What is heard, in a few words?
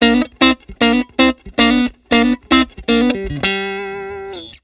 TELE NECK LICK; t neck pickup